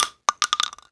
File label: shell bounce, weapons, shells; ModernWarfare